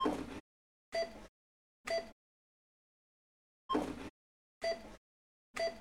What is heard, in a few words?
Till Beep; Aldi